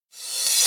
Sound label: Dr reverse